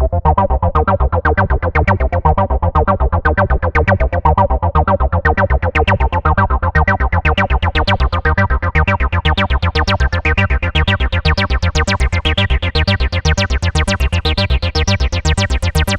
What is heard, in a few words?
Acid Colors